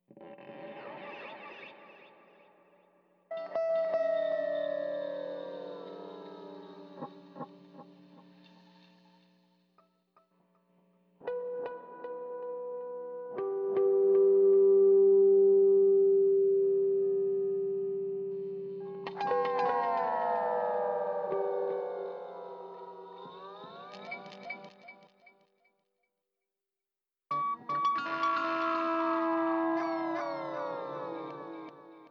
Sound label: guitar